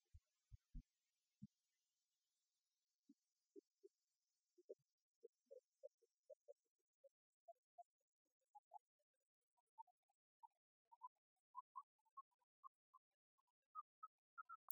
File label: 130 8 bar blowup out of phase, minimal techno